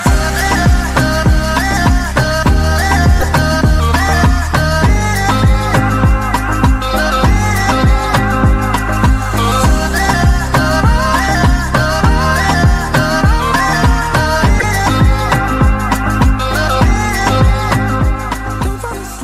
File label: new english trending ringtone